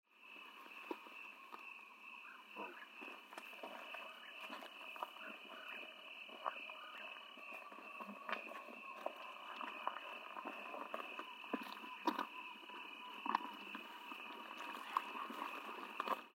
Whiskered Screech-Owl

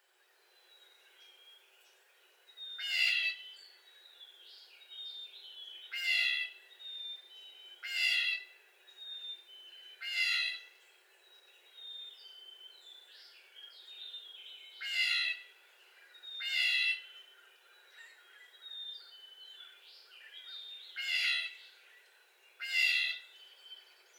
Blue Jay making whiny jay calls

